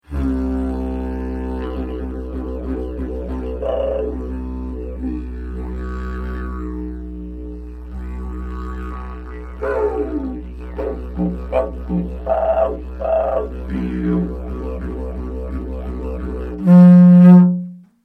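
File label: pvc C Sharp, didge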